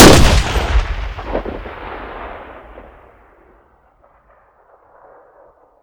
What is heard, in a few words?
weapon heli; STALKER; IWP